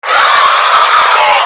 fear die

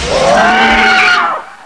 warrior hurt

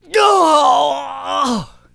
death; jihadist

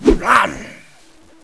claw miss

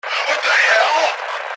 fear wtf

